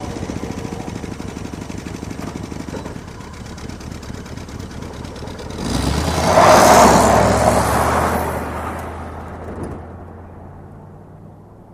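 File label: Car Idle Rev Away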